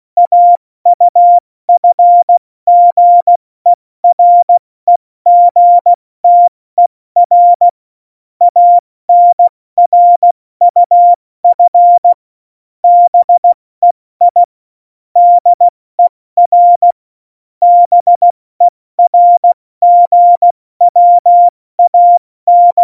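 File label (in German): Witz, cw morsen; Morsekurs; Witze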